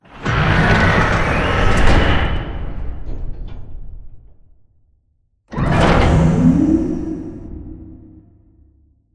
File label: Robot Arm Left Lower Rotate; Prop Warehouse; Titanfall 2; Boomtown